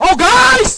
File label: nade